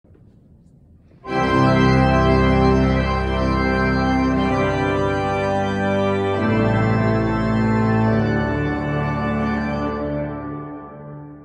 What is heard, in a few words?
pipe organs